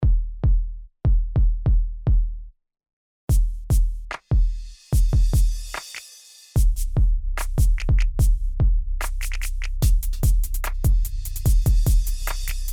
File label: Pagan Knight Loop Sampler